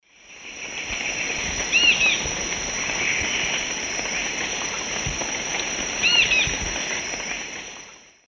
Myrmotherula multostriata, call female, S. America Eastern Lowlands